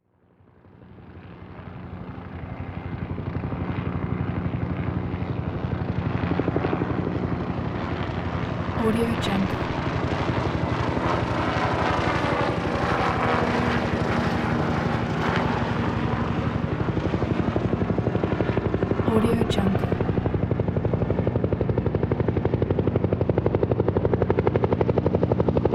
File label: helicopters three army passby; panoramathesoundoffilm